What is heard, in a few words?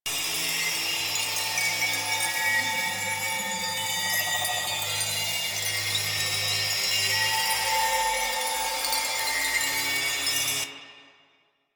Floating Circles Loop; ambience; Warframe; Entrati